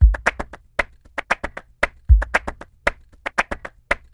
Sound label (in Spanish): Palmas por tango loop; Crowdsourced Sample Pack; Drum Kit; Drum Loops